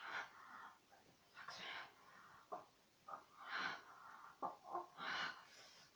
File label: click